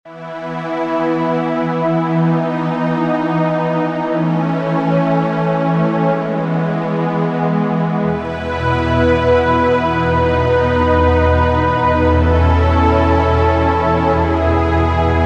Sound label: Underscore PAD; Worship Flow